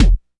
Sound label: Syn BD, Drums; BGM; Nintendo; July 2020 Gigaleak